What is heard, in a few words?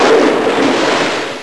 BOMB HIT, Military